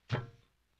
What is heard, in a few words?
Plunger Ploink Metal; Vintage Cartoon; Foley